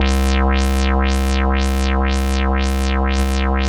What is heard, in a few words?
BASS C4; JGL BASS; Jungle; USB Soundscan vol.05; Explosive Jungle; AKAI; 90s sample CD